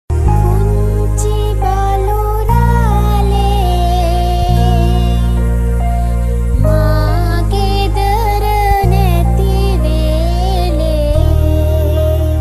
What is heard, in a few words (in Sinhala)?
Sinhala Lama Gee